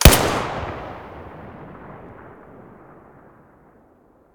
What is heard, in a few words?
tt33 muzzle stereo